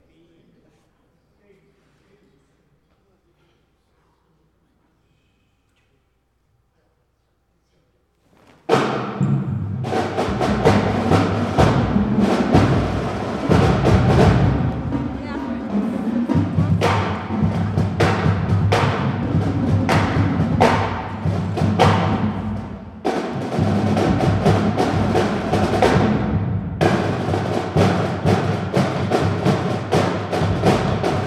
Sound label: Drum Solo